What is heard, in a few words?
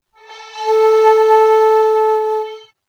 plastic Flute